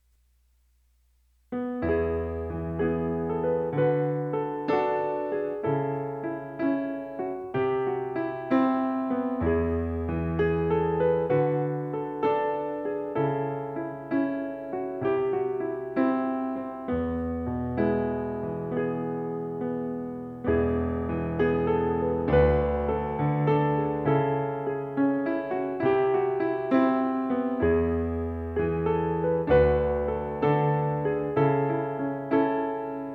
another old song on piano; Songtober

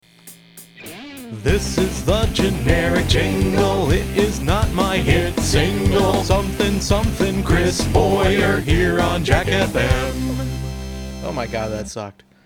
Fake Generic Test Jingle; DSC JINGLES

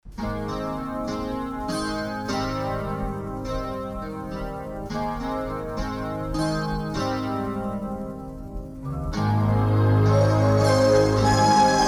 Mellow Guitar Thing

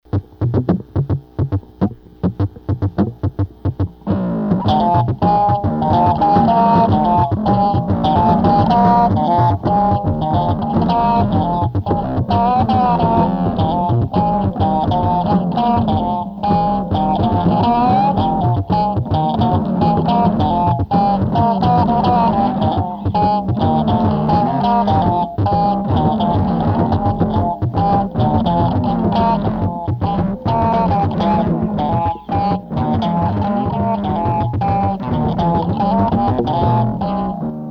Track Fretless Bass

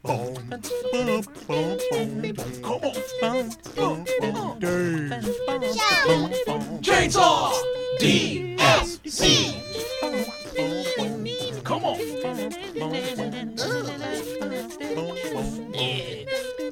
Beatboxing; DSC JINGLES

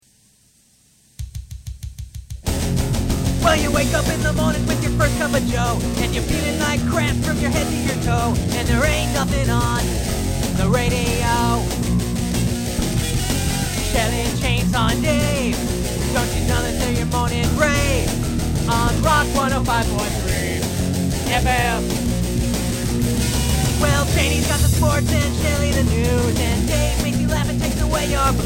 Rock Wake Up jingle; Rock 1053

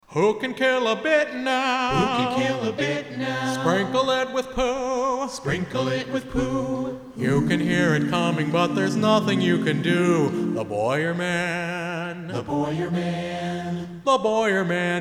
acapella

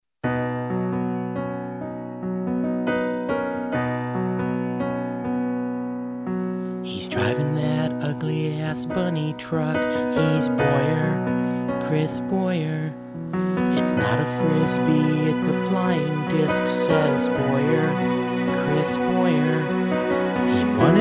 Piano Version

